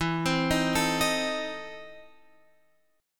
E Major 7th Suspended 4th Sharp 5th, Guitar, Open F; EM7sus4#5 arpeggiated